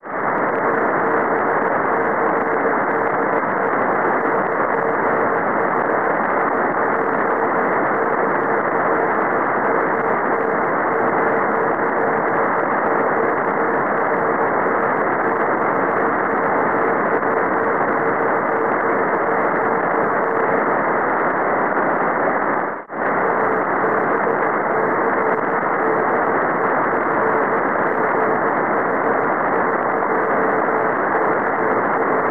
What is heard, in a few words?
Noise FSCW